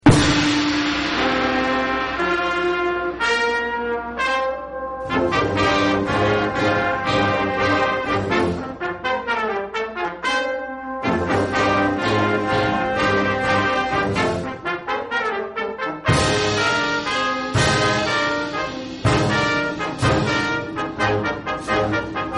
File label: fanfare; black hills